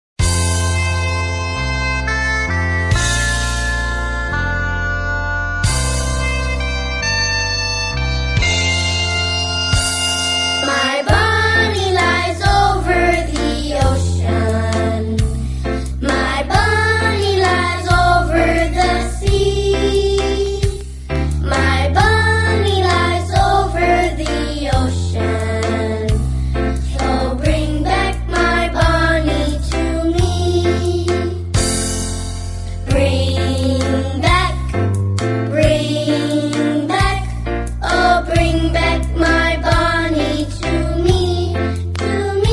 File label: english songs for kids